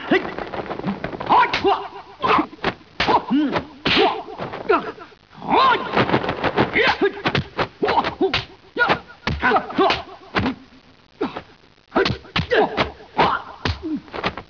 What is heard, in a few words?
Fight; 特酷声音素材库